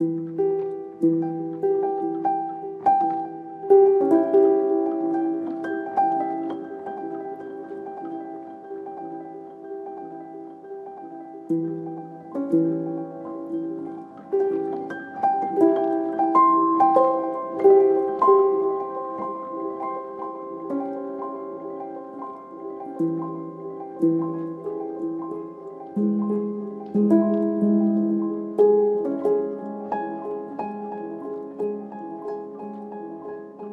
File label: Piano Slow Mood Atmo